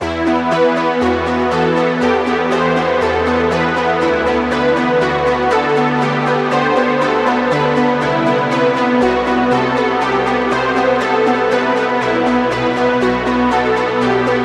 Key; Routed Sound; Spire Expansion - Reality Maker Vol.1

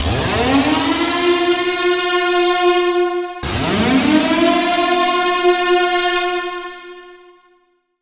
SFX - Warning Siren; Routed Sound; Serum 2 Expansion - Chaos Bringer Vol.1

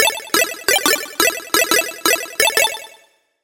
Go Up; SFX; Routed Sound; Serum 2 Expansion - Hyper Gamer Vol.1

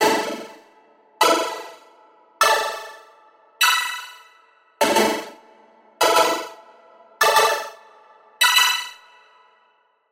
SFX, Gain Item; Routed Sound; Spire Expansion; Hyper Gamer Vol.1

